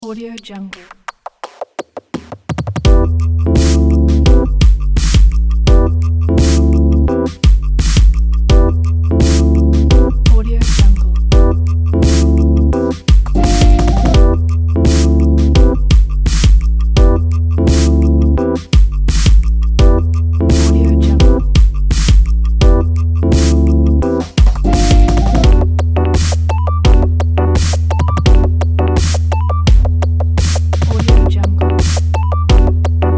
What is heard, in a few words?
broken hiphop jazz